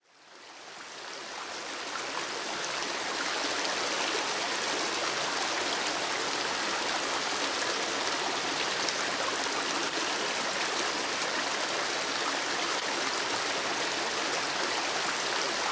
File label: riverrun; ambient; water